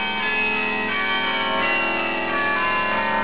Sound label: carillons, bell